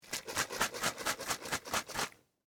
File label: Mousse Can Shake, Household, Mousse, Can, Shake; Spraying (Sound Effects:Objects); BlastWave